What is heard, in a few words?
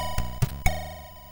sid MOS6581R2; Frostbite